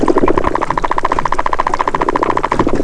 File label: cuskeel, fish sounds